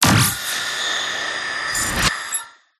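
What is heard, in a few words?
Events Level Ups Power Ups; PMSFX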